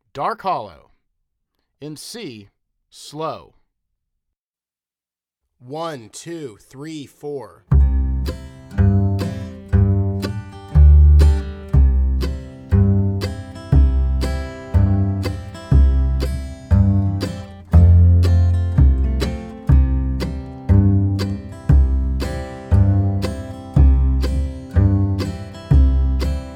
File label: Dark Hollow C Slow